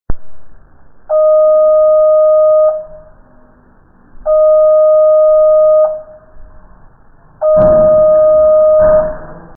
oven timer; vapesquared

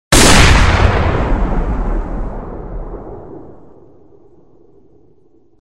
gun

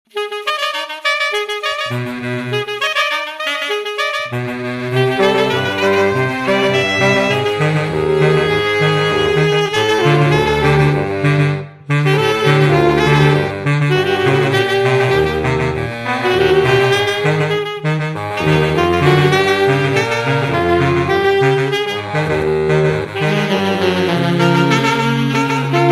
sax

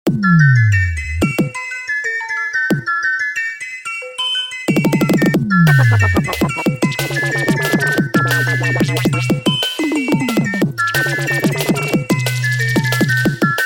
dub

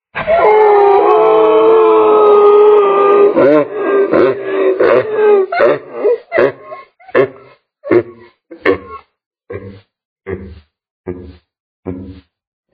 laugh

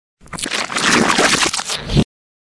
splat, reverse

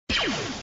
blaster; reversevape

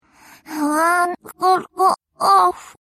clock, reverse

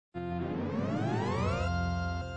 keyhole; cave

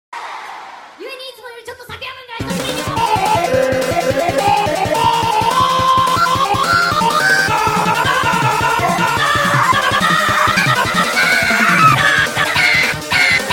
pitch shift

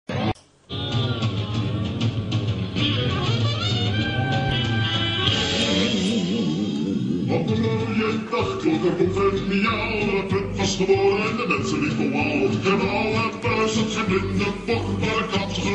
cat dog chant

